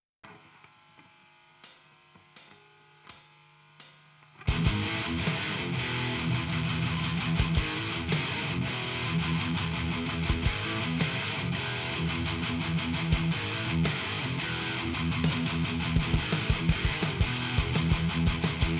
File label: riff